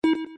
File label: time countdown